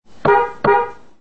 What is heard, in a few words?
klaxon, car horn; chaser